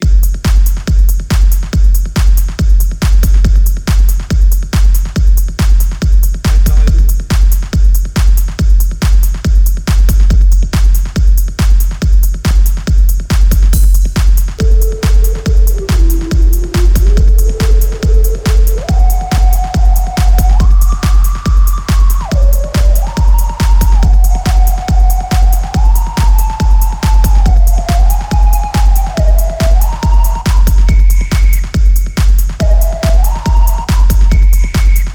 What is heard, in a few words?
Techno Loop A